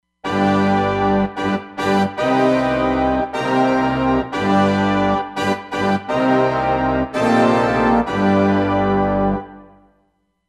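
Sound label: Heavy Brass, Roland Fantom XR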